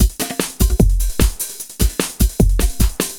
LOOP, Eurotech; Sounds Good

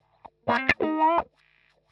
wah C, Nu Jazz House, wahwah; PowerFX - Nu Jazz House

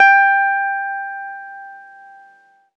ZITHER; Best Service; Alpine Volksmusik

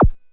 Drums, Hits; DCM8

